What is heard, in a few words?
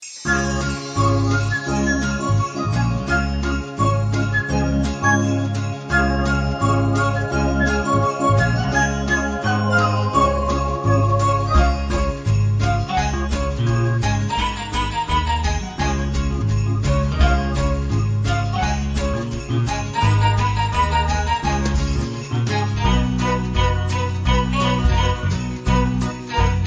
birds theme song ringtone